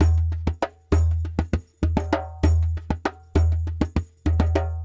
Lg Dumbek

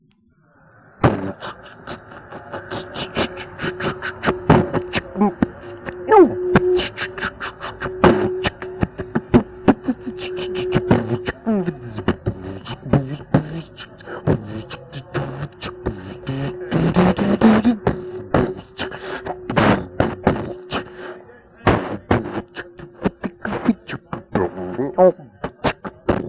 mouth music

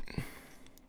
breath

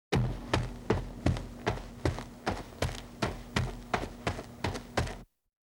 one person running; Soccer; Butterfinger Video Sound Effects; Soccer Running Chainlink and Huh

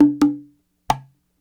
Cahon

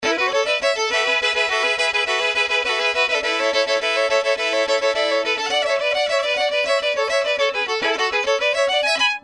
Cajun

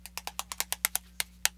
flip through notebook; kontakt

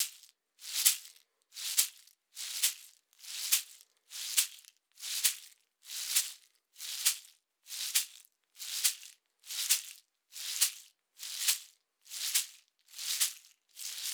Gourd shaker; Warrior (Tohoqashaw)

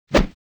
swish single; crashes swishes impacts; Asian Show Sound Effects

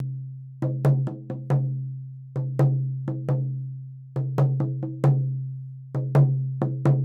Buffalo Drum; Nightsong (Hobeea)

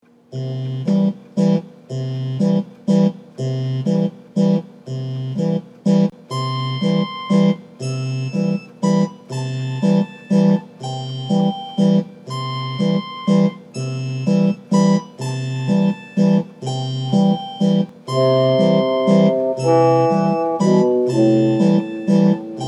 Sleepy Waltz

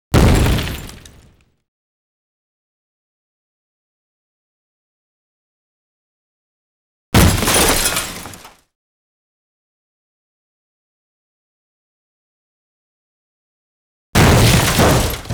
impacts sweeteners; Asian Show Sound Effects

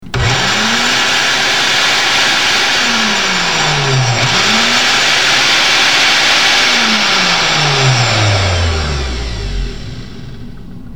blender

